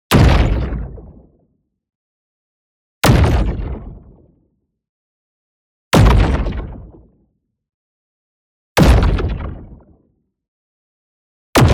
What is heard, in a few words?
EXPLOSION LARGE Distorted Body Punch; boom library; Matter